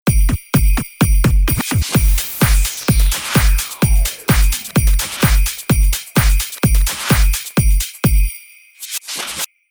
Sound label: Kick Beats; pack noel